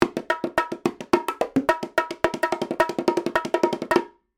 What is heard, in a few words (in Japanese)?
ボンゴ